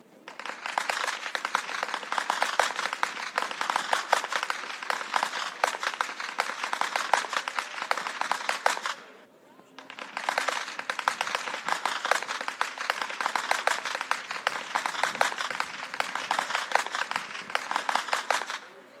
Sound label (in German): schnalzen, jugend; waging